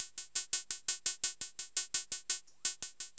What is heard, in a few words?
LOOP, DRUMS & PERC